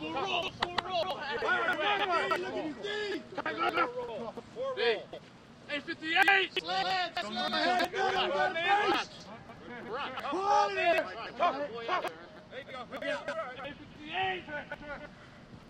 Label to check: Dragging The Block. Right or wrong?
Right